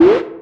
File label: ui open; vellum